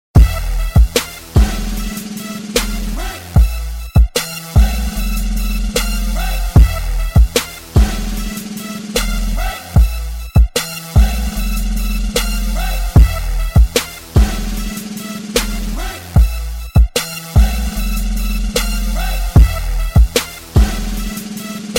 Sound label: Cristal Bass